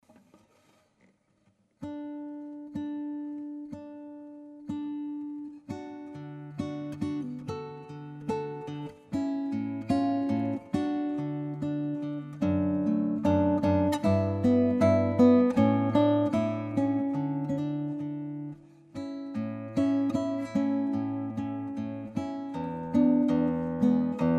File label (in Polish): rondo, gitara; Rondo D dur